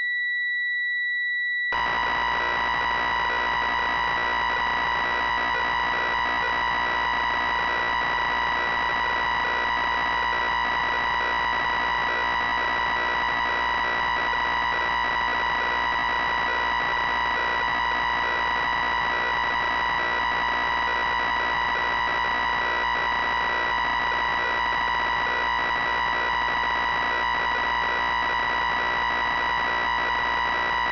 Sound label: VX90 all patch dump; synth